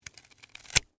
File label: Rope Cut Nylon, Industry, Rope, Cut, Nylon, Rope; Hand Tool; Tools; BlastWave